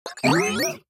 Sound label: Droid Chirp; Wulfrum Droid